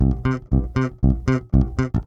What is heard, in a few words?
bass loop, Rock; ACID MUSIC